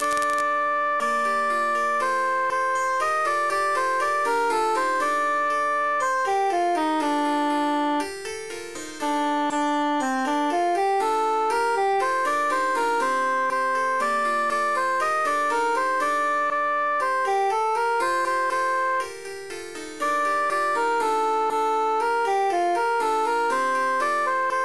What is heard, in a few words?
transposed cantus emphasized; iacob scalam